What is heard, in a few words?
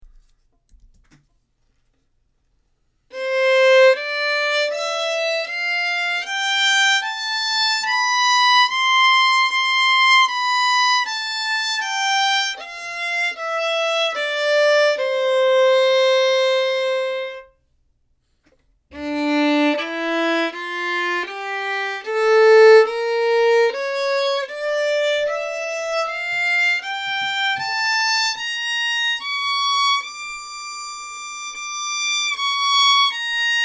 Junior Audition Scales